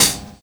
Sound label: Cell HiHat; Sonic Foundry (Sony Creative Software); Drums & Drones; Construction Kits